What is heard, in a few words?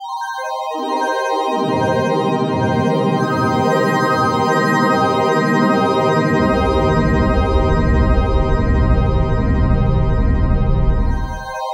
SHIMMER; Bolder Sounds - Granular